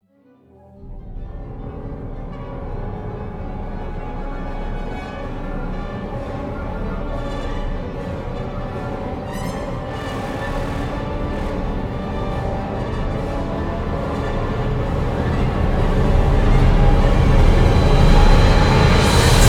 No Choir

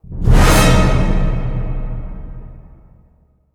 Orchestra Hit

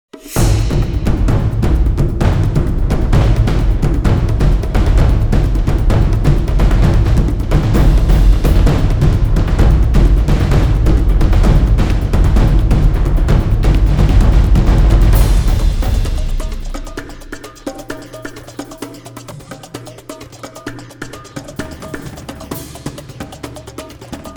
Drums

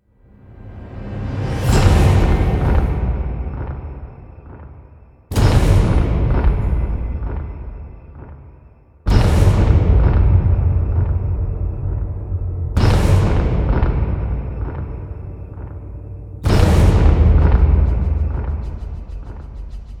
Hits Only

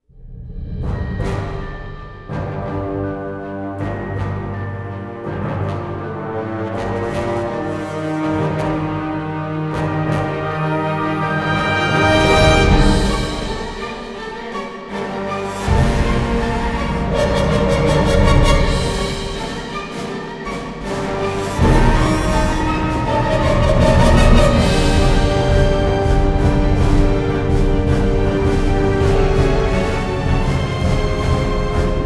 Rise Of Evil (Orch)

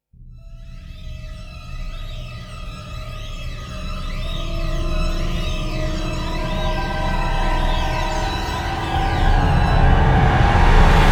Rise

